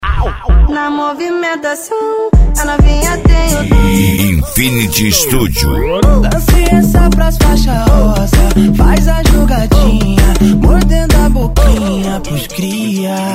EletroFunk Funk; Infinity Studio; Itaja GO

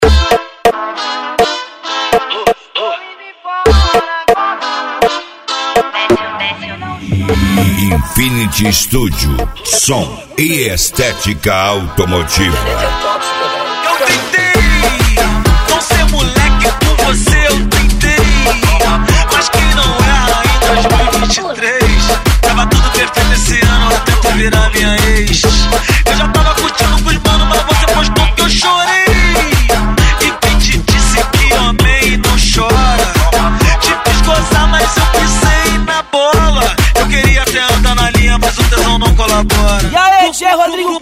EletroFunk Funk; Infinity Studio 2024

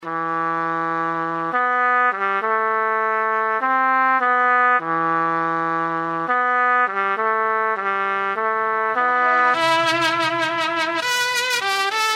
Reggaeton

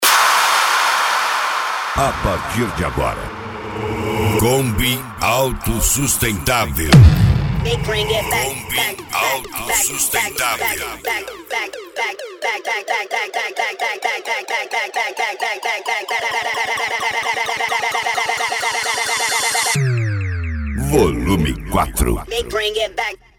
MEGAFUNK E PANCADAO

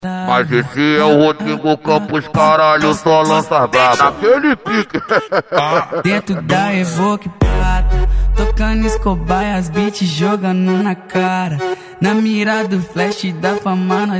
MegaFunk Funk

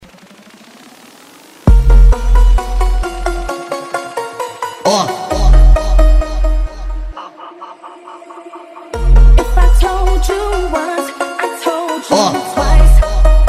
EletroFunk Funk; Infinity Studio 2024

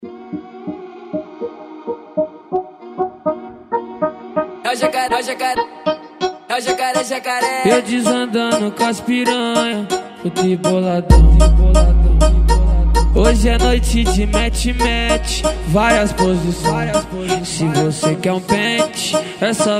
EletroFunk Funk; Infinity Studio; Itaja GO

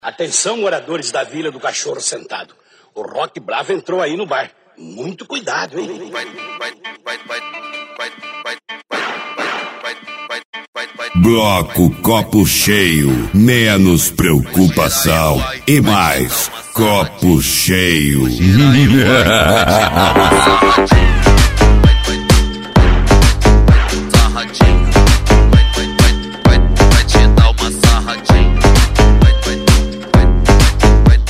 Funk MegaFunk

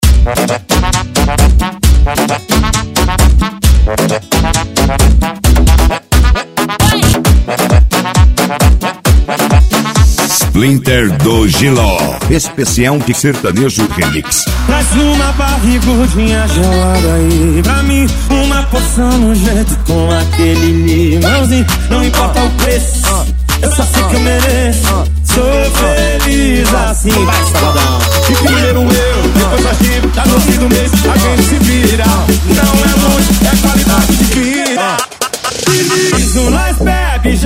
Sertanejo Remix

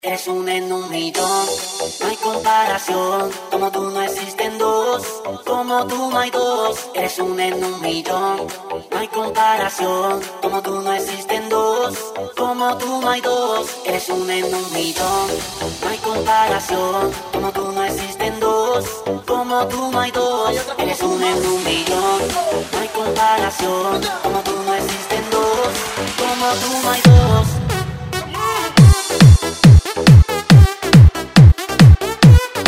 Pancadao; Baterias Agressive Power Vol 17

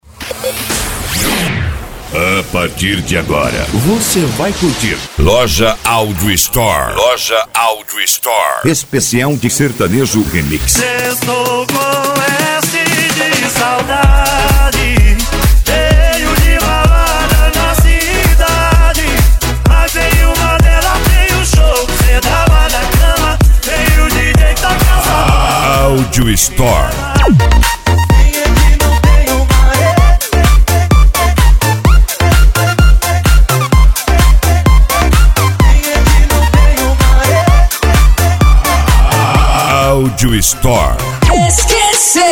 Sertanejo Remix

